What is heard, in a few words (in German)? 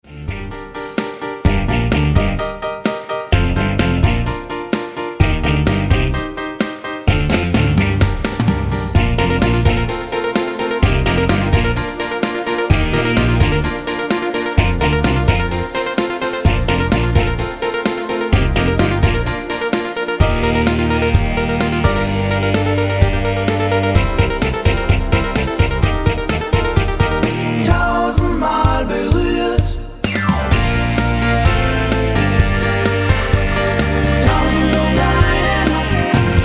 mit Chor